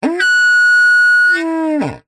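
foley blowing air into pipe with squeaky mouth piece weird sound design element; zapsplat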